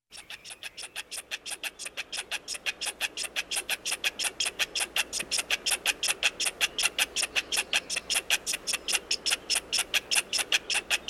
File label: Squirrel Awaking